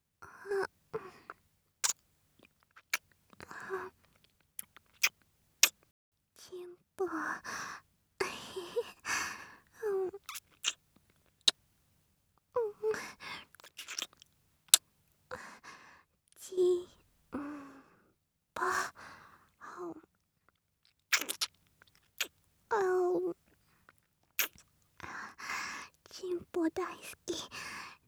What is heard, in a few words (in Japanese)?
ち○ぽ キス, ASMR